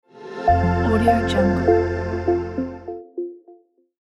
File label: the soft logo